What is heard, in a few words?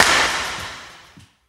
80s Clap; Drums